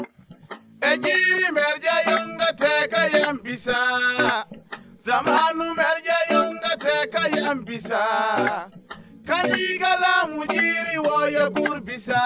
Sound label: voice